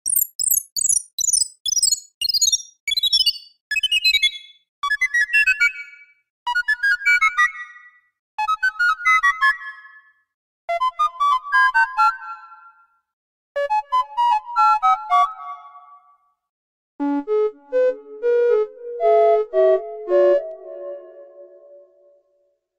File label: goofy ahh ringtone but it gets slower